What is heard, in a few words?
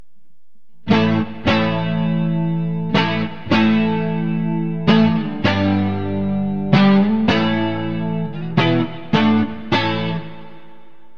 neck clean; Burny